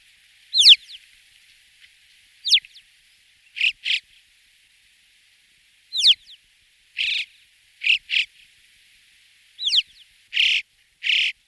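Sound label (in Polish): wieszczek, krukowate - corvidae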